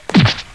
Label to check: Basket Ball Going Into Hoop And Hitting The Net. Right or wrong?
Wrong